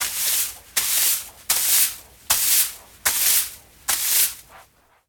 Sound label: WHISK, DUSTING; 1000fx